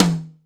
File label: Tight Mid Tom